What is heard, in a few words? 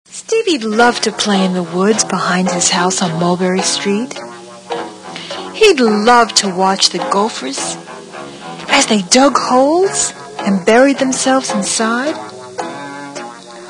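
background music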